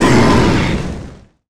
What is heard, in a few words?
Sack Miner death; KuberaMine